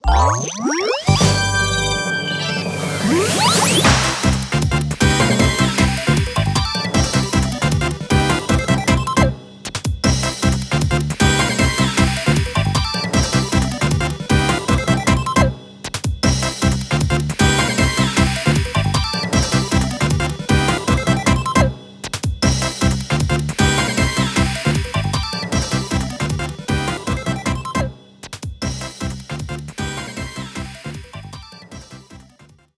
Goal; Pac-Man & Galaga Dimensions; Nintendo 3DS

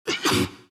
Wheezy Cough; The Binding of Isaac

